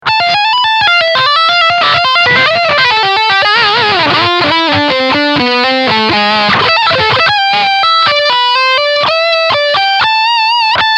Leadsound Steg Humbucker

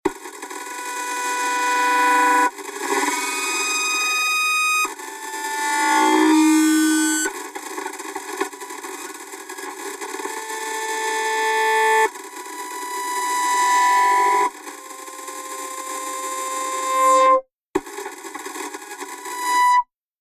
Gamelan kaputter Fernseher

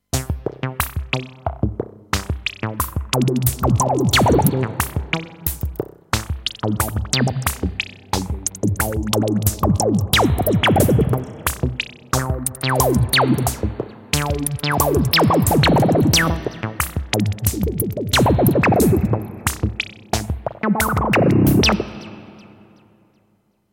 Blubb Beat

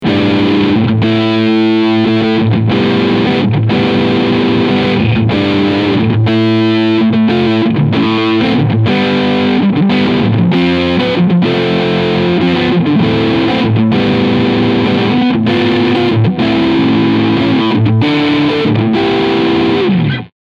Epiphone Les Paul 1960 LTD beide PUs Crunch

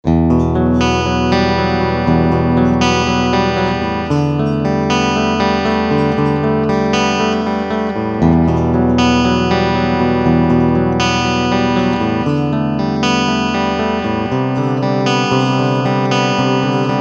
Clean Picking Steg Humbucke

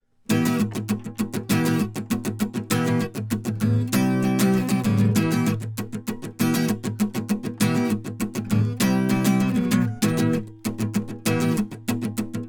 Akustikgitarre Perkussiv

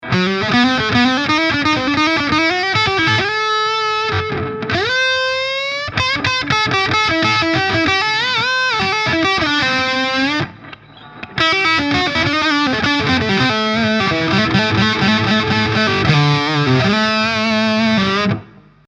Steg verzerrt

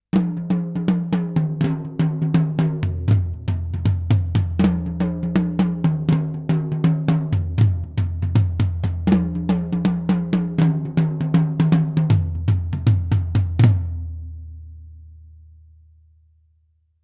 Manultec MT E.8012 Toms mit WAVE; ORCA BAY

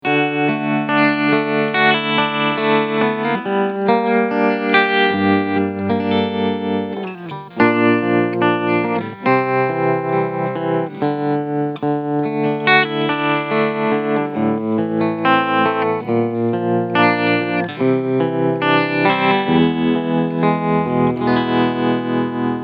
Vibrato pur

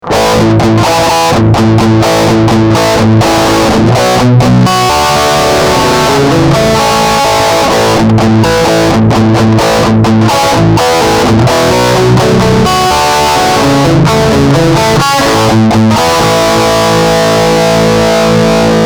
Verzerrer

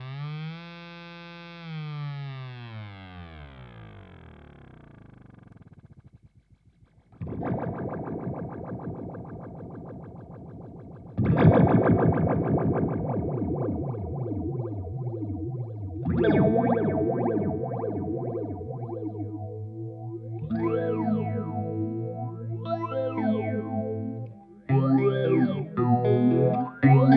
Lethargy Gitarre; Dreadbox

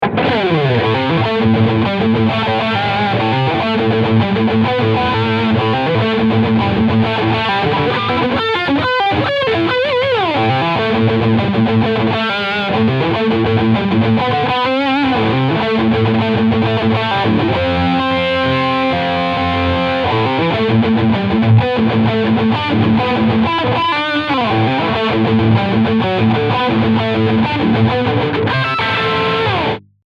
Leadsound mehr Höhen

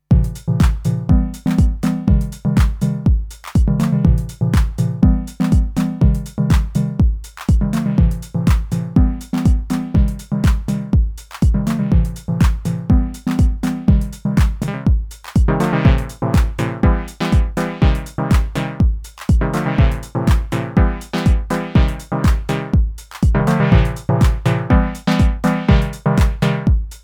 Minimoog Wellenformen Akkord

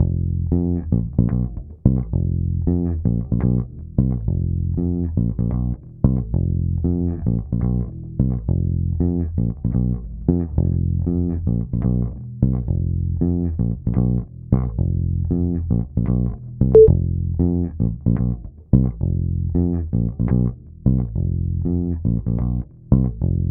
E-Bass

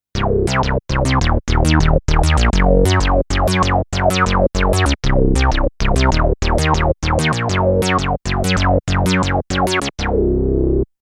Reso Bass Unison 2 Pol; Curtis

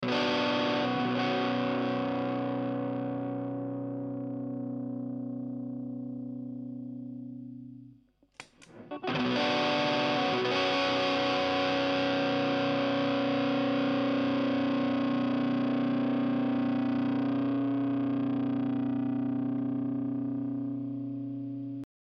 Aria chord verzerrt

